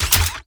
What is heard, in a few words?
Gun mech Reload